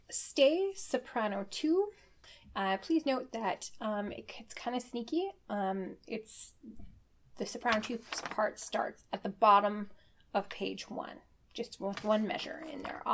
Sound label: soprano